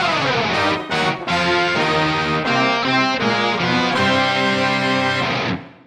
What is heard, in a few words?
victory